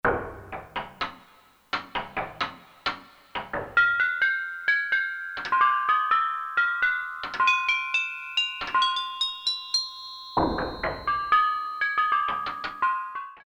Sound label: Japan FX, Korg DSS-1, Japanese Inst; Japanese Inst 2